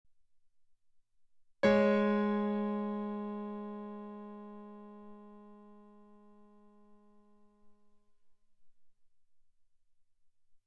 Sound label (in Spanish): intervalos abiertos